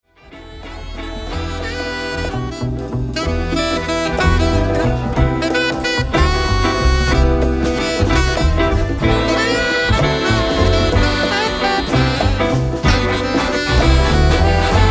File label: big band inst